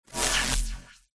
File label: magic wand zap spark power; zapsplat